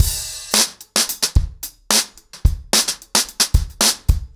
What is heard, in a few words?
110BPM, ACOUSTIC DRUMS; The Roots Drumloops